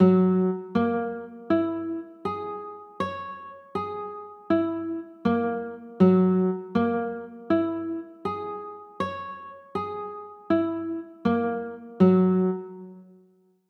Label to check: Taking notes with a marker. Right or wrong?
Wrong